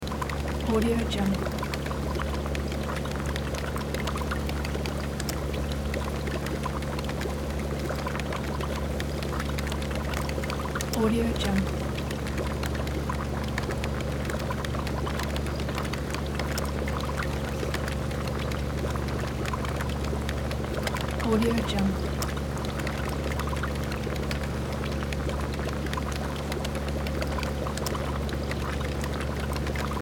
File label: laboratory ambience loop